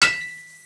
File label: hammer on metal; PanzerE; Desert; CD-Gamer 1999-10